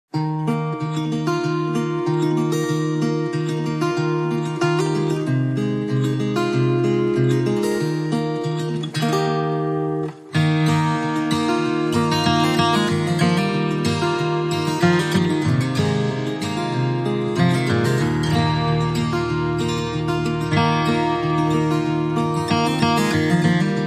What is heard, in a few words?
No Glockenspiel No Banjo